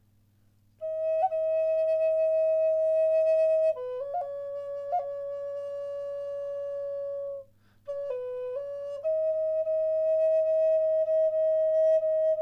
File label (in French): Double La en érable